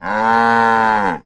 Cattle, Cow Moo, Bellow. Single Low Moo Bellow. Close Perspective.